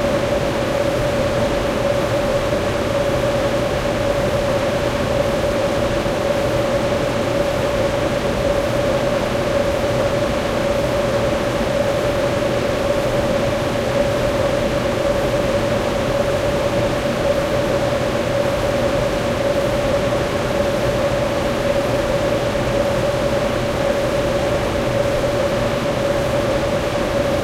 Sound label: eerie; Golden Crayon Sounds